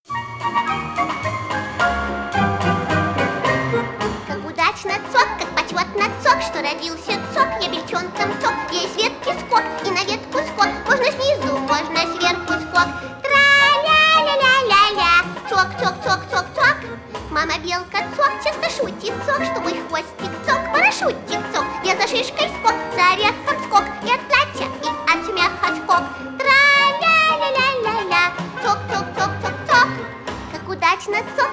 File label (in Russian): Песенка Бельчонка